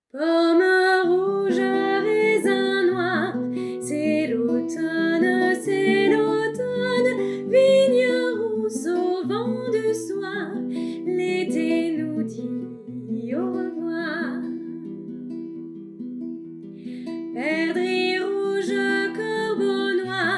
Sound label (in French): guitare voix